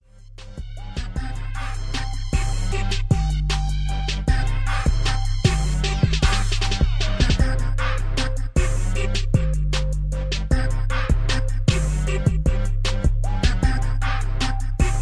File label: 77BPM